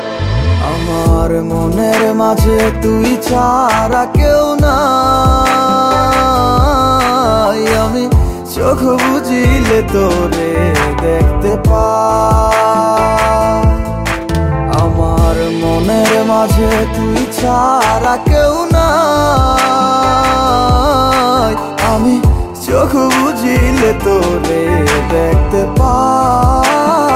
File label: ringtone